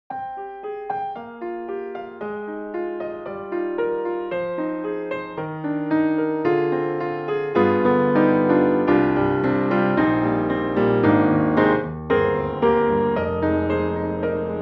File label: Black Grand; VI ONE